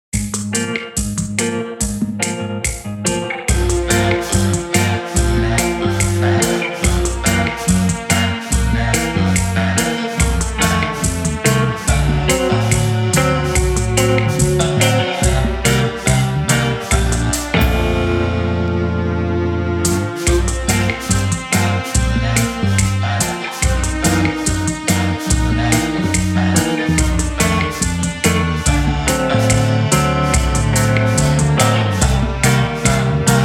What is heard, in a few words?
Jazz